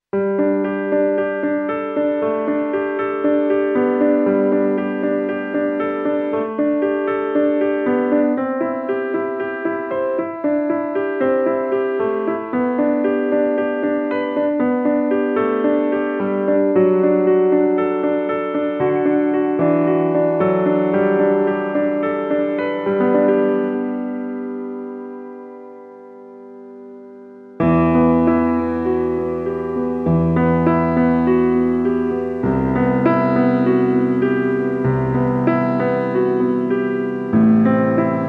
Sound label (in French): AMBIANCE LECON DE PIANO